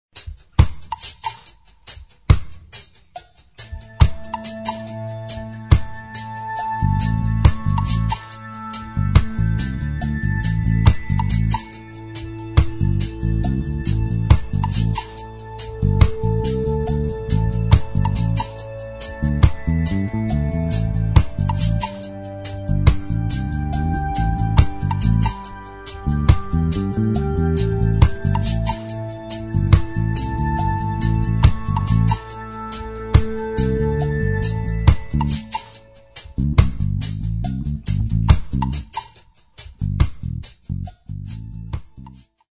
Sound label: BASS TRACE; Suite Collection Vol.5